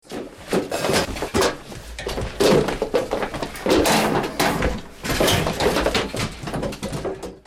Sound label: house smash searched